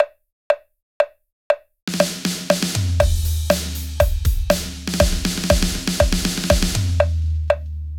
ドラッグ

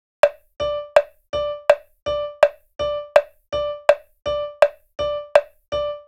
クリック

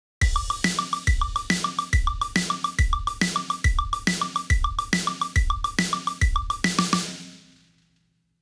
3連符のやつ, drums; shuffle; rythmkan

